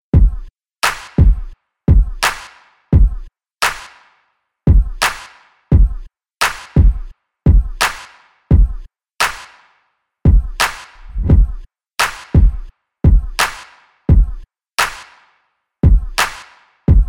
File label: beats loop track bpm 86